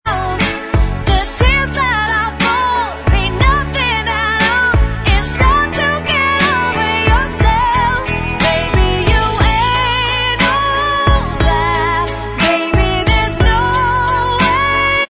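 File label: Inst Track, instrumental track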